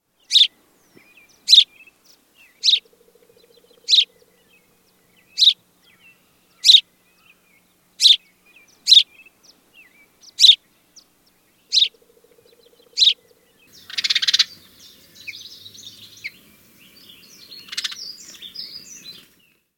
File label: house sparrow